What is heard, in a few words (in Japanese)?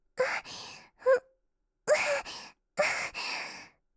セックス喘ぎ声; カスタマイズ用音源